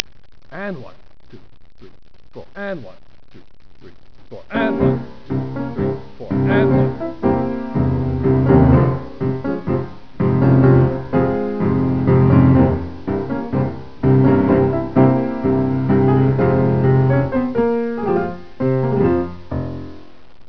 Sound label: tango